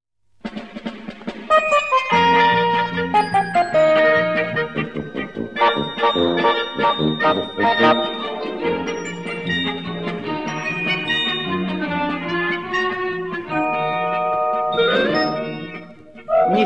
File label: guard song; Emerald city